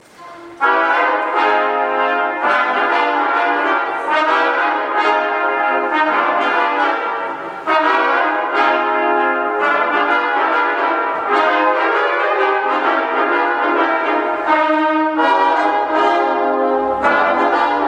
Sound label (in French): tromp jazz; quatuor de trompettes